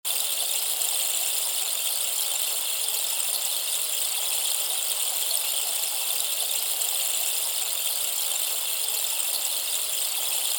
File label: harmonizing rain pillar 50 cm okoume